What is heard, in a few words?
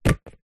sport soccer ball catch two hands